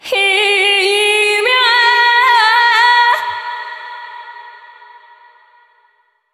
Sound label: Vocal Hi Yaha C sharp 170